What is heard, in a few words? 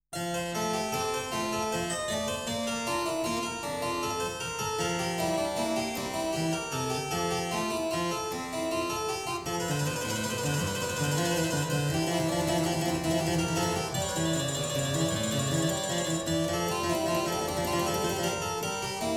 Prelude in F major, clavier; Prelude & Fugue No.11; BWV 856; BACH; WELL TEMPERED CLAVIER; BOOK I